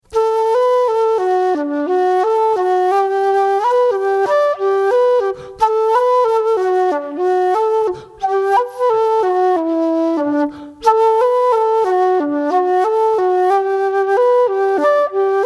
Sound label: Slowest; Shannon Breeze